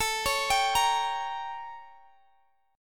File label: A 7th Flat 9th Guitar Drop D; x,x,19,18,20,18